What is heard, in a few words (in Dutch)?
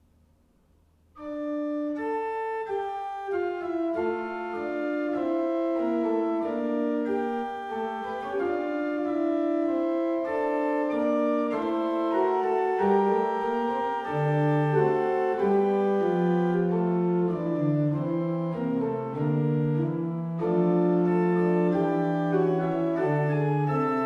Magnificat fuga, orgels; Pesse; Johann Pachelbel